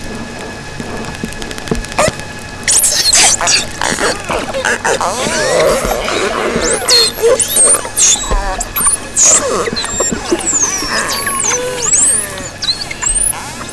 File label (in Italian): Delfino comune